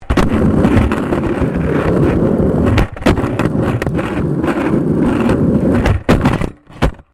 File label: SKATEBOARD UNDERSIDE ASPHALT ROUGH; Skateboard sounds; Skateboarding (Sound Effects:Sports)